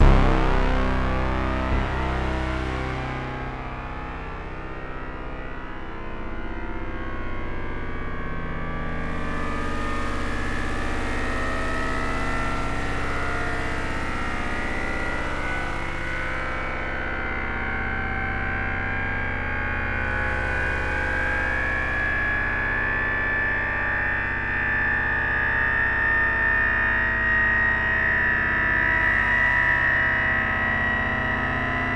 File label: tense 1 note drone drone